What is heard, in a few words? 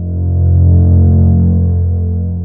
radar, ambient